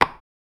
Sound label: casual block puzzle game